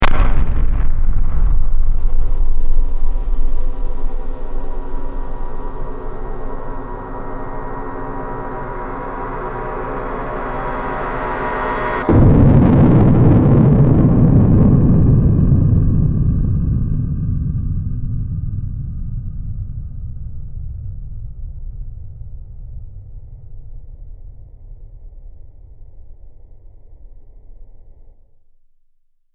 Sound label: Heavy Impact Stinger, Stinger Sound Effects; sound design